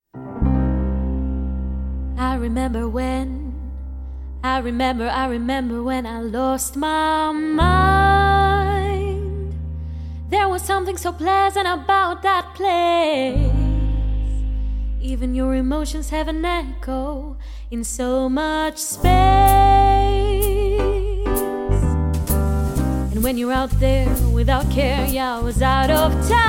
jazz

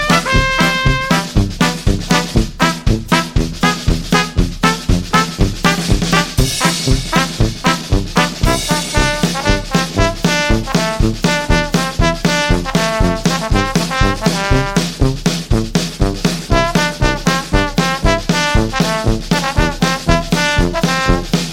brass

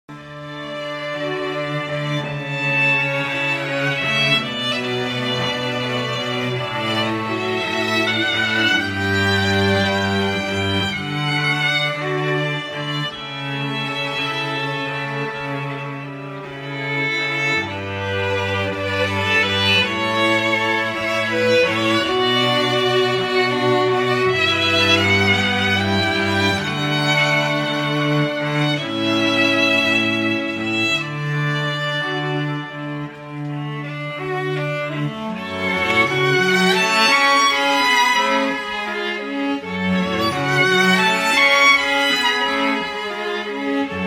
strings

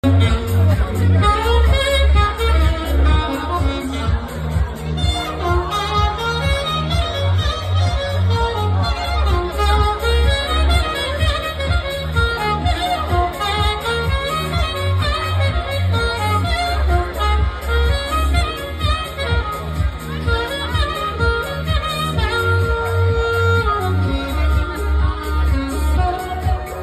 sax

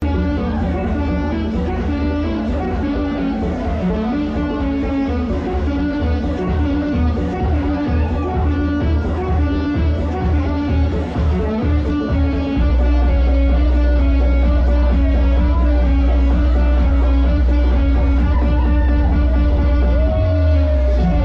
sax

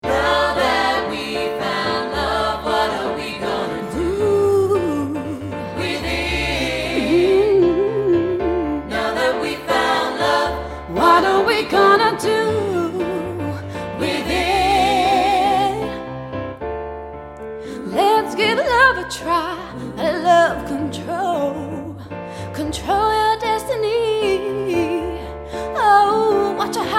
funky gospel choir

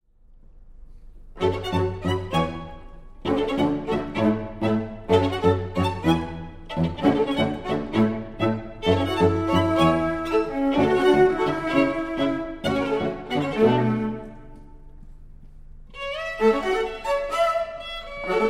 strings; classical musicians

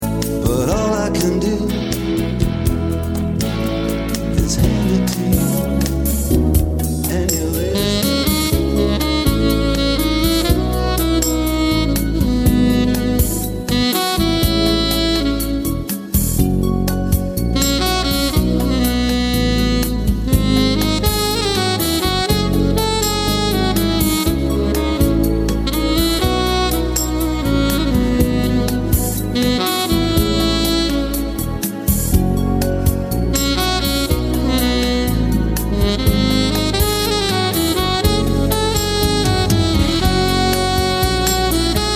sax

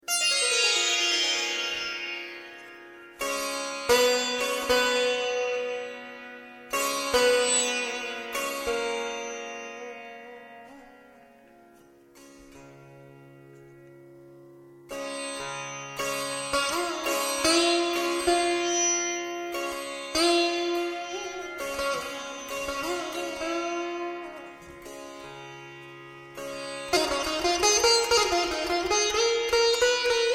Sitarist; Thumri

